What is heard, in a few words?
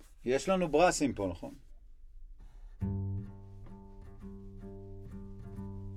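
Contrabass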